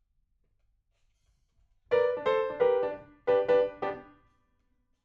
PIANO, RNR for piano

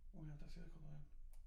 Children Choir; Studio Bekol Children Choir

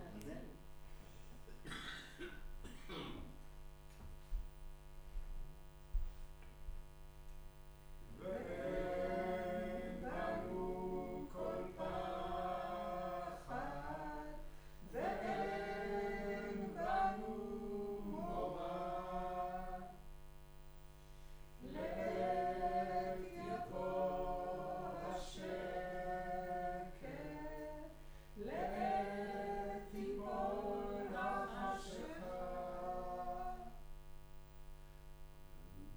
Room, Choir

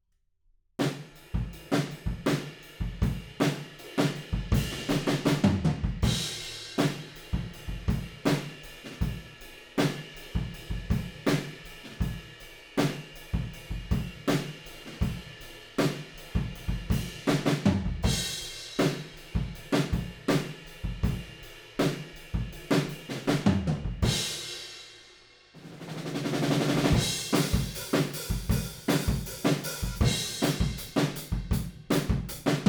Room, 160 BPM

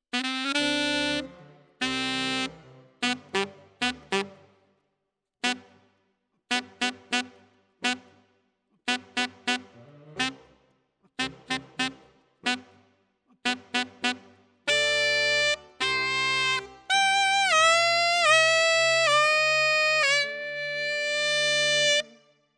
Alto, RNR for piano

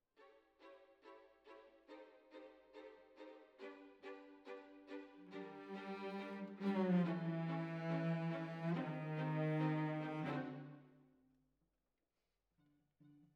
Cellos Over

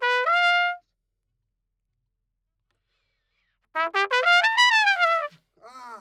Trumpet

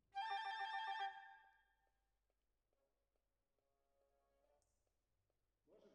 Clarinet

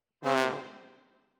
Tromb ASS

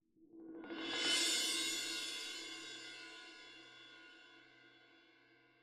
Cymb Close

